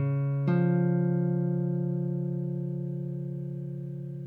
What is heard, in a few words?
interval d arp, guitar